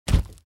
Close fridge

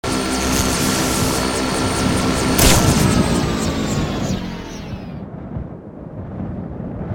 Joybuzzer; Infiltrating The Airship

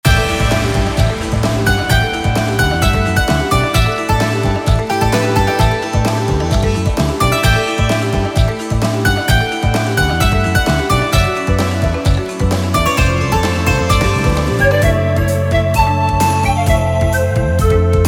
Cave; Witch Explorer

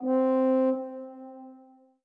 orbit hornsolo; Sky Children of the Light gamerip

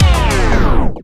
DJ Disco Stop

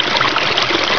AMB RIVER

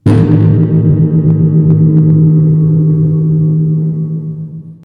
Gong

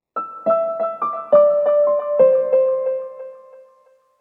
One shot Piano

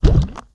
Jam; candy crush jelly saga gamerip

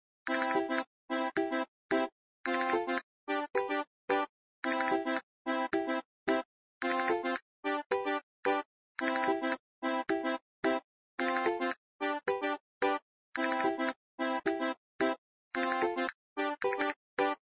Jukebox Music; papas cupcakeria online windows gamerip 2013

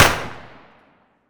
Weapon fire single SMG

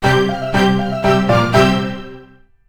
result normal

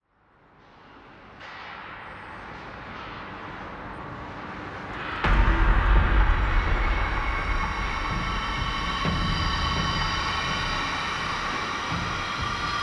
Industries Ambience; tamarin o.s.t 2020; Insekt Industries

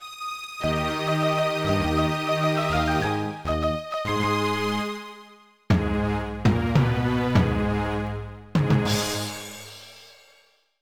Boss Clear; mario vs. donkey kong mini land mayhem; Coaster Hills

